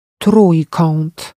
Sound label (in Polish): trojkat